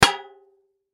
Impact Tin Large Empty Hit Hard; No Lid; Metal; Sound Effects:Materials; Blastwave

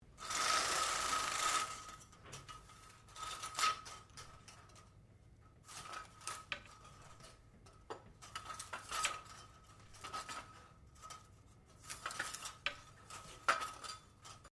Blinds Venetian Brush, Household blinds; Living Room; SoundEffects-Foundation1

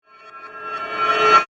Whoosh Crescendo, Ramp; Whoosh Sound Effects; Whooshes

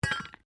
WRECK METAL PART IMPACT GROUND, Wrecked Cars; impacts, crashes, scrapes; Crashes (Sound Effects:Objects)

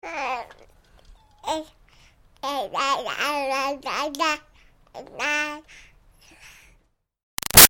Baby 6 Month Old Talk Ya Ya; Babies

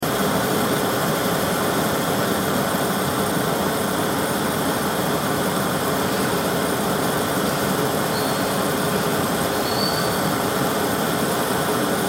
Welding Flame Run Loop; Welders; Machines; INDUSTRY

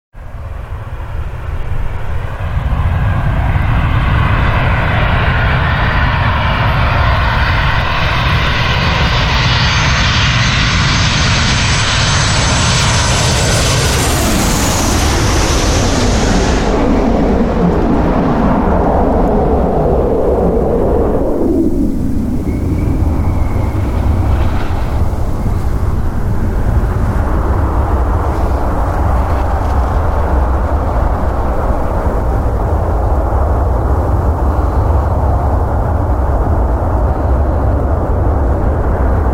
Jet Aircraft; Jet aircraft passing overhead and landing with reverse thrust; Airplanes (Sound Effects:Vehicles)

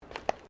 FOOTSTEPS THONGS (FLIPFLOPS) WOODEN DECK STEP

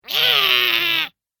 Sinister Fiend, monster; Andrew Fleming; Twistedtracks

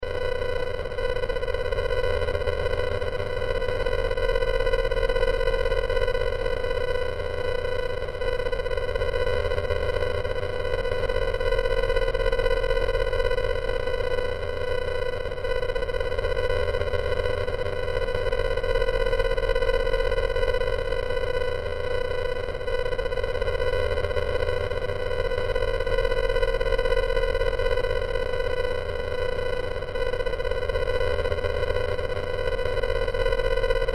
Sci Fi Radio Static High Distortion Loop; Communication

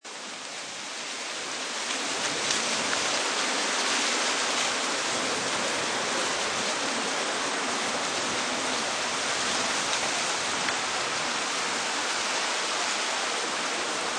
Wind Through Dry Leaves, Wind blows through trees; Weather

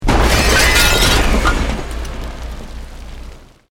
Explosion Medium Brick Wood Glass; Created explosion effects, house, building or vehicle effects; Bomb; Bombs

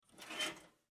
Household Toast Oven Door Open, kitchen

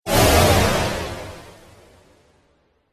Space Trek Swoosh; Whoosh Sound Effects

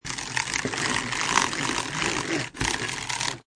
Pencil Sharpener, Sharpening pencil machine; Pencil Sharpener Sound Effects; Pencil Sharpeners (Sound Effects:Offices)

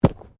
Body movement Fall Medium

